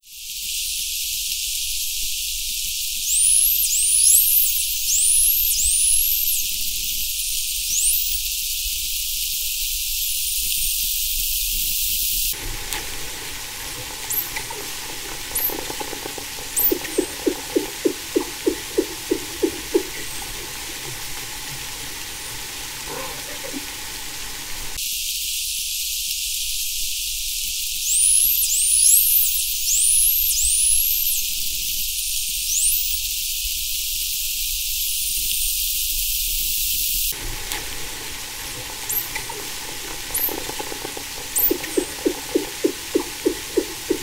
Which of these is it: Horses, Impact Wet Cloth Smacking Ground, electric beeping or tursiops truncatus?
tursiops truncatus